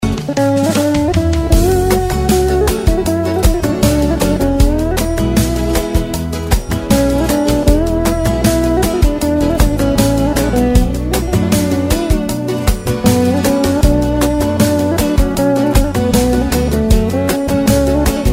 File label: Mobile ringtone